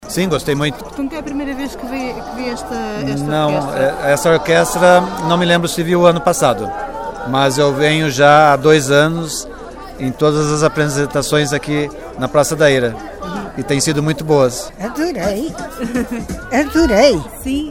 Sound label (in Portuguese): concerto bandolins